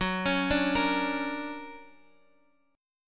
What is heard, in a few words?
D Augmented Major 7th Gb, guitar